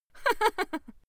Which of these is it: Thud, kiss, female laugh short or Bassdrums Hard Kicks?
female laugh short